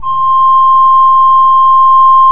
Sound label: KOPPELFLUTE C5, Cathedral Organ; Propeller Island